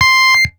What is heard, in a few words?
Bass - C6, Synths; Mamas Bass; Ableton Live 12 Lite; Core Library